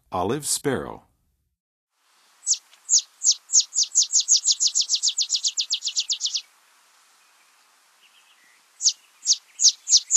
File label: Olive Sparrow, Songs of Birds of North America; Western USA; Western USA Songbirds